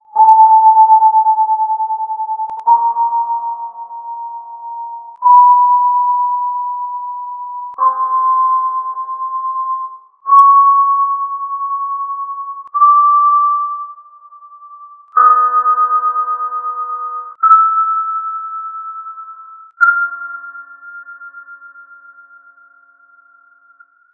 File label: Roland M3 Filtered